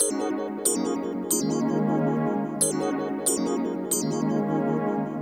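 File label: strings, Hip Hop and R&B Drumloops; Best Service ProSamples vol.01; AKAI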